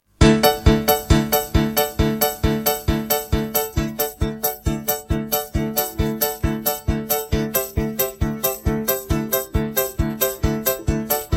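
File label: backing track; schitalochka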